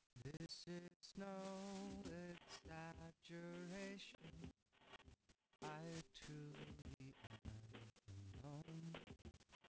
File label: wdrums inst src; SiSEC11; underdetermined